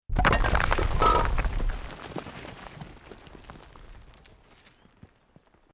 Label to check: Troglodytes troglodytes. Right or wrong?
Wrong